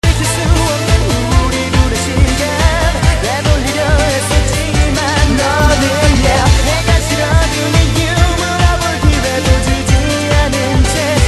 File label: techno love hot